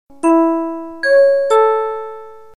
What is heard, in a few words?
KBING!; zapowiedzi; regiopol